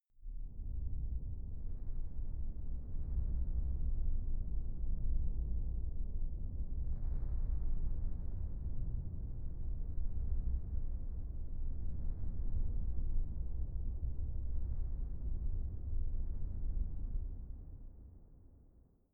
space damaged shuttle; raumschiff

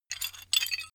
metal handling pieces

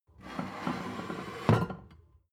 plate stack slide table, gastronomie; soundpool

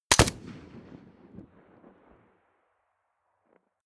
rifle ak-47 exterior fire single bolt; comedy cartoon; pistolen gewehre; Pistols and guns

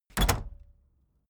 case lock close; objekte; tueren

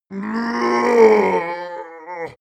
male giant die; menschen; schreie

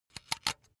razor retract comb; soundpool

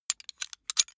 metal clicks; industrie wirtschaft; metall

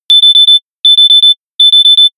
notification pager

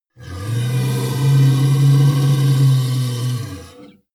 monster inhale low; kreaturen

